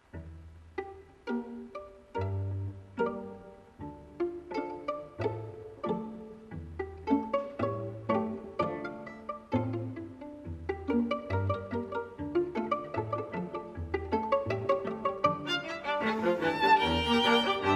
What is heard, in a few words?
Two Guitars russian Gipsy songs; String Quartet